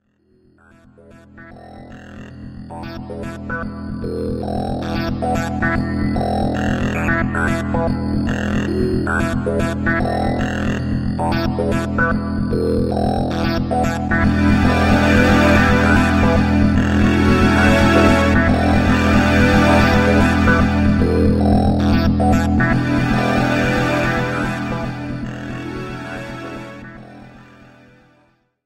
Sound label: softwinds